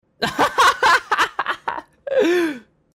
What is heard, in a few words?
lmaoooooooo